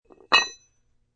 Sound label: Weight Drop, Iron weight dropped onto concrete floor; Weightlifting (Sound Effects:Sports)